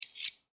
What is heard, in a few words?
Silverware Fork, Silverware Fork Scrape Knife; Household; Dishware